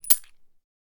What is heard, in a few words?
coin sound; game inn